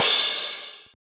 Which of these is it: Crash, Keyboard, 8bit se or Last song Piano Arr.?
Crash